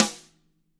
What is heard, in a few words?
rim; ILIO; Double Platinum Drums 1; DW SNR